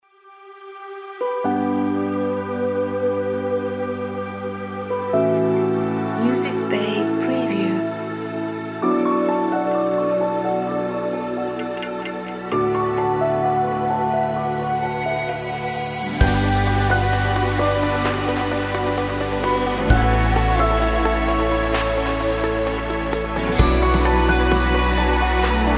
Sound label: Ambient Post Rock